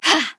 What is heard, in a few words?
Grunt Attack; Paladins; Skye